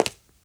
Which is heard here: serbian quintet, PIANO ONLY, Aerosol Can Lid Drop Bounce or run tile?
run tile